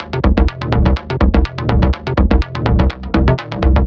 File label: 124 Cm, Melodic Loops; M8; Electronisounds